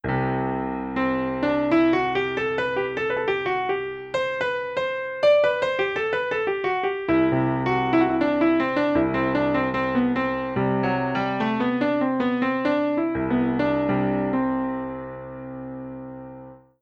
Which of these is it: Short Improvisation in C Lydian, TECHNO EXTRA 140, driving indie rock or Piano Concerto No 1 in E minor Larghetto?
Short Improvisation in C Lydian